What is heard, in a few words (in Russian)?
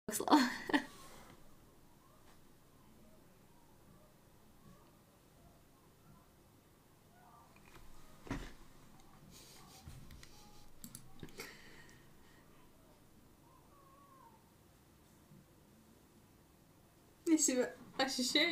стоны у соседей; звук на максимум